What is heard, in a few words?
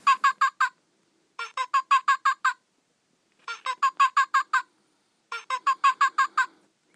monkey